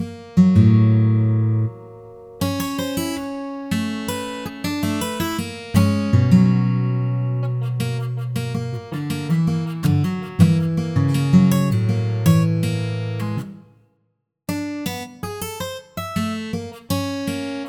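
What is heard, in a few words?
guitar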